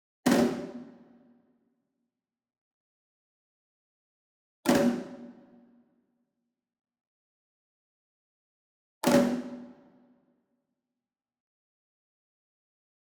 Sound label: ENSEMBLE DRUM Shime Daiko And Chinese Tom Flam Medium; boom library; Cinematic Strikes Construction Kit